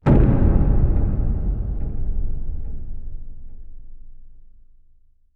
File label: Cut Scene Stingers